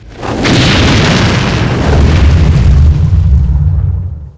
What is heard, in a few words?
Explode; pcrazy; Bovine